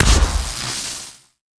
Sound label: hit shock hard; CrossCode; battle